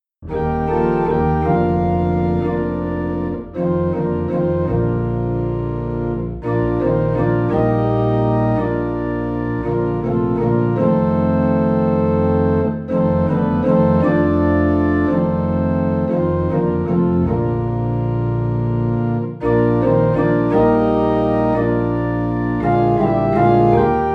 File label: Organ Low in B Flat; Have Thine Own Way Lord